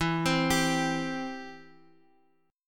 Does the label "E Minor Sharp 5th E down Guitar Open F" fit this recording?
Yes